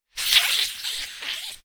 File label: Air can doppler